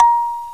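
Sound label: kalimba high; FAIRLIGHT CMI IIX; PERCUSN1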